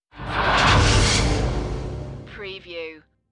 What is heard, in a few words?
Dark Production Whoosh